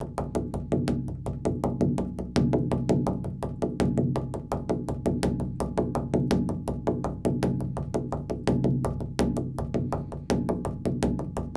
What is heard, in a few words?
Painted drum hands; Little One (Teetich)